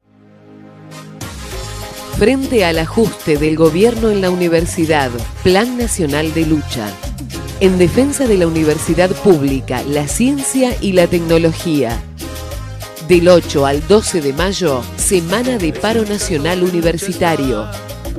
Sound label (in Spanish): musica ambiente; musica ambiente semana de paro; materiales para semana de lucha